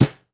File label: Steel Brush, Snares; Ghosthack - Free Snares 2023